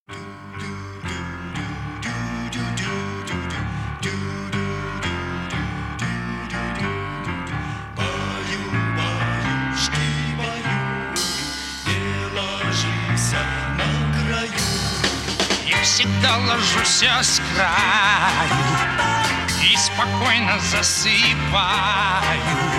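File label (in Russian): Колыбельная, детские песни; pesni iz multfilmov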